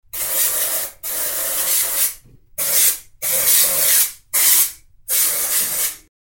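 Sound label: aerosol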